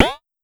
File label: Pollard Perc, Syndrum